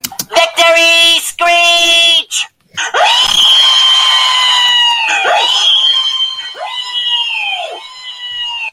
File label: victoryy screeechh